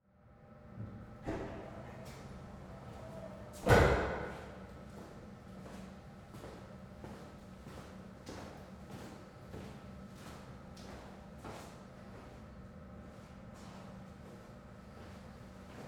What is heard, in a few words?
Ambience Public Restroom Man Enters Pees Wash Hands Exits; AdobeCC